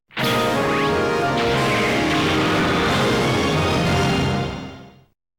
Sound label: Commercial Bumper Sting